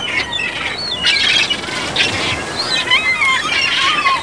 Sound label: seagulls; Environment